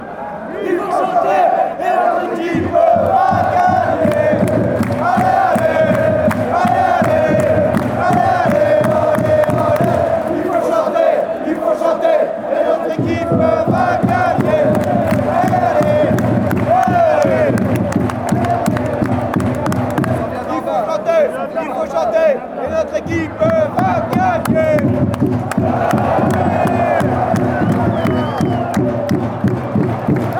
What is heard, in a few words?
alez alez, chants; standart